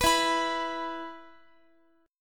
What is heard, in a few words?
E 5th E down Guitar; strummed